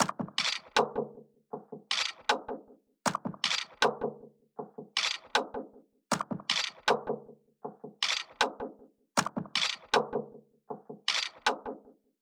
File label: Echo Percussion Loop 157 BPM; Percussion Loops